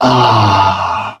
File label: "ah", male; Voice; ooh ahh